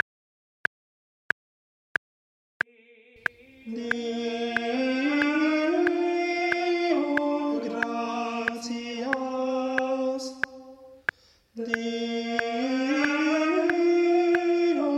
Tenor Click 92 Crotchet

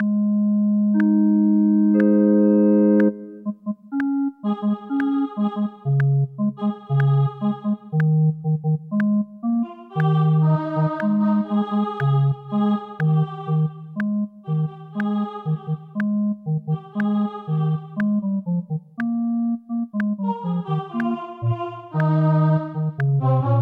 O clap your hands; Gibbons

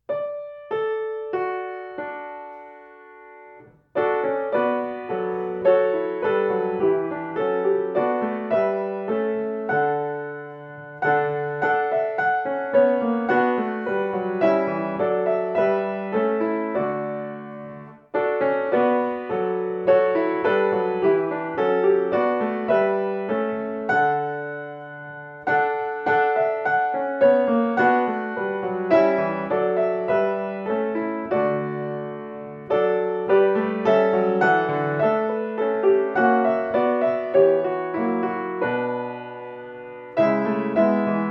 accomp; Sei Lob BWV 29

